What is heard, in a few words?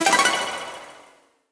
Impact FX; Cymatics; Video Game Sample Pack Vol 1; Galaxy Rush